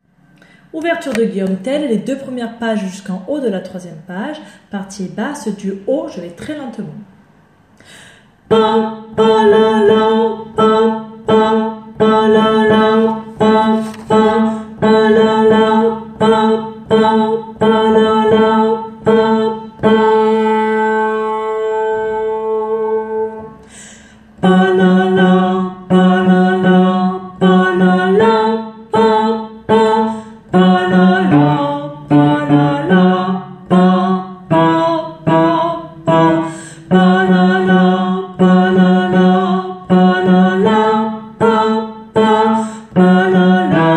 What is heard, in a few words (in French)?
Basse haut; G.Tell